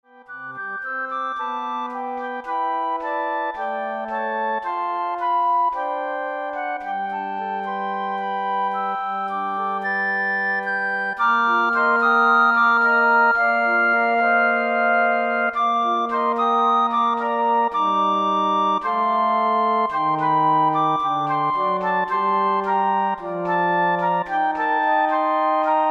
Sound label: Flute Ensemble